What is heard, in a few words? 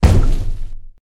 Mech Stomp; lethal company; ambience; windows